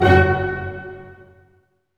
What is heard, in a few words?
HIT STACO, Staccato Oct; String Master Series; Dynamic Orch